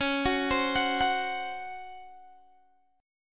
C sharp Major 7th Suspended 4th C sharp Guitar Open G